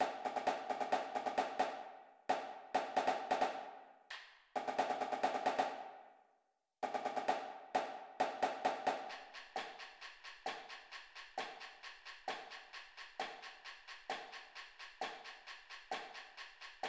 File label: snare, drumline